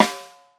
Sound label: phat snare drum sample g sharp key; snare drum one shots